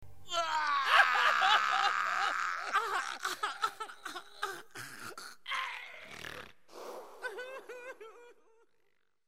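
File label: xnik, whimper; Хнык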